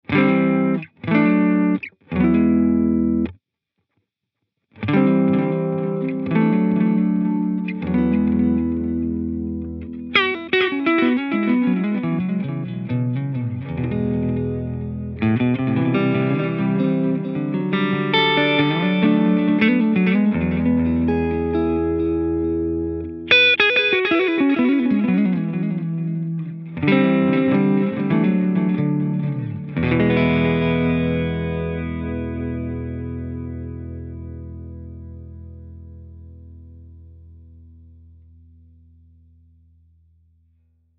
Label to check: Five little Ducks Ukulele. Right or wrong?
Wrong